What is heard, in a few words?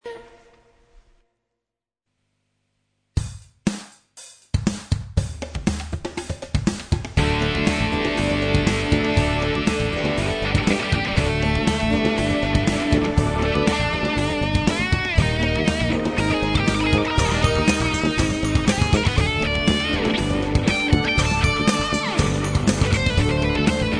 jam solo